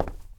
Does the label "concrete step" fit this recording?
Yes